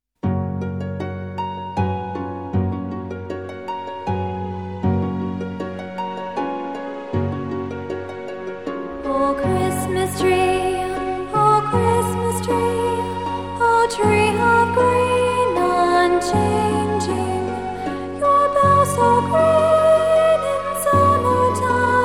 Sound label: Childrens Choir, Christmas Favorites